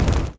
archmage hit; propack

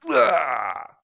archer die; propack

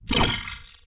ghost armor attack; propack; magic

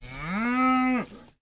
cow die; propack

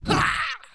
daemon attack; propack

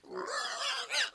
pig die; propack

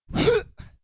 swordman attack; propack

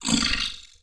ghost armor die; propack; magic

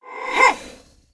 summoner attack; propack

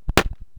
stickfighter attack; propack

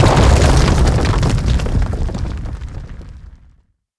magic building fall; propack; archmage tower

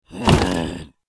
behemoth attack; propack; magic

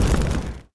summoner hit; propack

